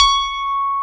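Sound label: Elec C#6, electric piano CP70; Best Service Gigapack 1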